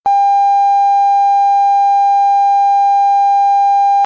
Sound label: weather alert